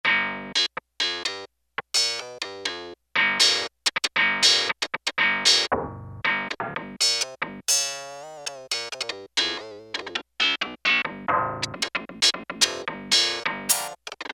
Clav, Roland W-30; Factory Performance Patches; GrungoClav 1

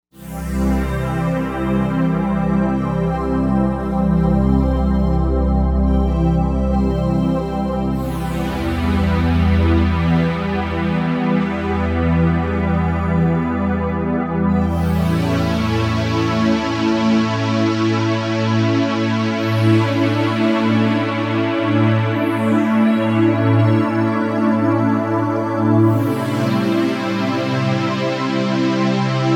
Novation UltraNova; Factory Patches

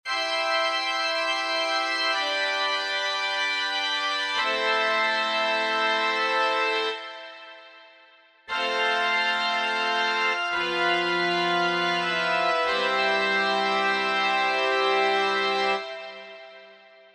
TUT GRND ORG, Church Organs; Universal Sound Bank Samploscopie Classic Vol. 1 Church Organs

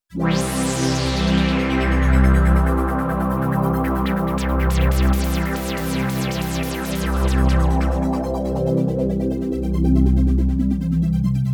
Sweep Pad

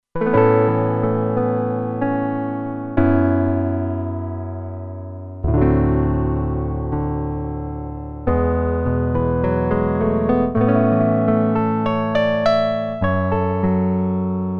Piano 2, Roland Alpha Juno-2; Original Sound Chart patches

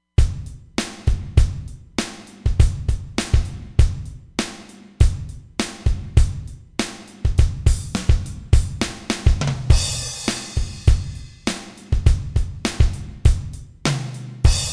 Rock Kit 1, Roland Fantom XR; Drums

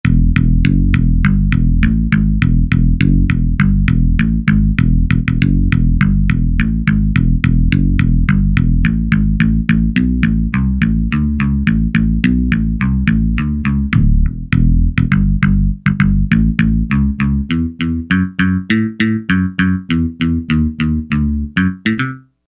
Thunder Bass, E-mu Proteus-1; Presets

